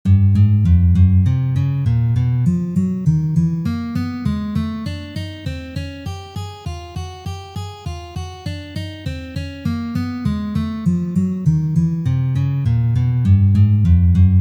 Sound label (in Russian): Uprazhneniya dlya gitary